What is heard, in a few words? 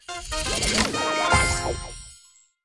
Unlock City Tour Chapter; subway surfers city sound effects; Android, iOS